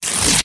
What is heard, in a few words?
Index Card Tear; Office Supplies; 1001 Sound Effects